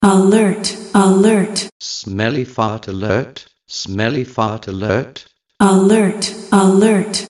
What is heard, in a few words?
Smelly Fart